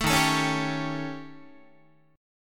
Eb 7th Flat 5th Eb down Guitar; Eb7b5 strummed; JGuitar

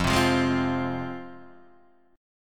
F Minor, down, Guitar, Half Step Down

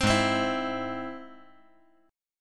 G sharp Augmented G sharp; G#+ strummed; 5,8,8,7; JGuitar

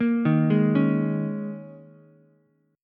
Eb 7th Suspended 4th Eb, Ukulele; arpeggiated; chord

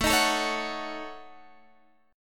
A 6th Flat 5th, A6b5 chord, guitar; strummed; JGuitar; x,12,13,14,14,14

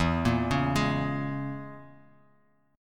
E 6th Flat 5th Bass Standard, E6b5 chord; arpeggiated; JGuitar

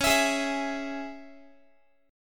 Db Minor, Guitar; strummed; JGuitar

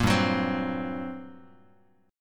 G Minor Major 11th, Bass Standard; GmM11 strummed; JGuitar

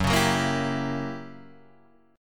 Gb 7th Flat 9th Gb down Guitar Standard; strummed

